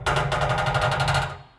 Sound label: Creak Metal; Puddle; puddle plus ios gamerip 2018